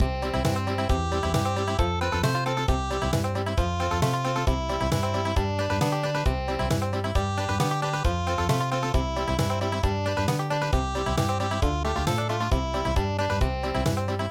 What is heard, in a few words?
Country; Baa Baa Black Sheep